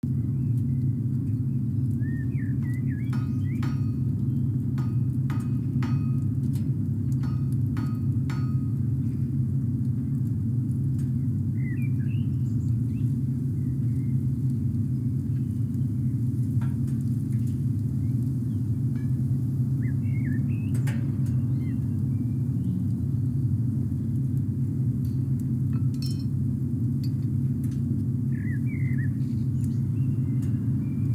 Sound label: Ambience; Kaelaverse: Pemascape